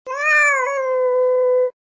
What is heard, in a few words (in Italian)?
Gatto